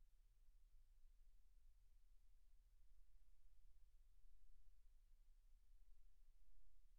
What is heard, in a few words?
silence 48kHz